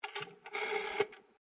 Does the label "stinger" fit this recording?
No